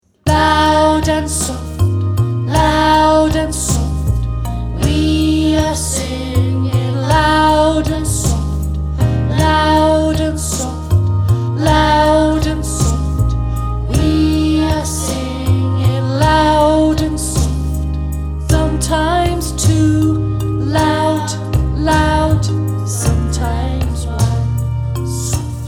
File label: Loud And Soft song